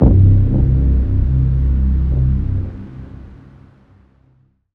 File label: synth note low C; Virtual Riot; lowboi